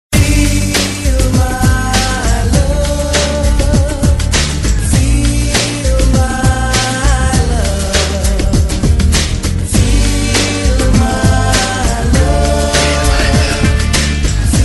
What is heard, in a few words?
telugu love ringtone